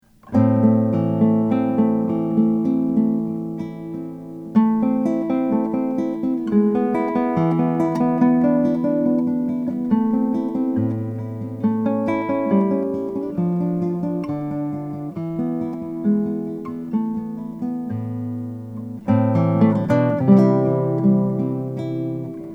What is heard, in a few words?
buscarino cabaret bolivian rosewood